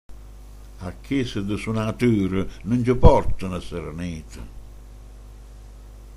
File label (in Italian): Suonatori